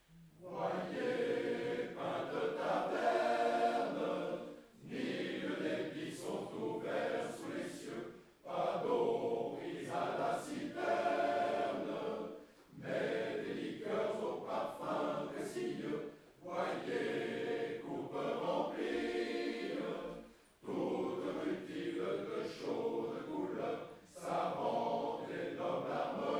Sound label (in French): Chanson joyeuse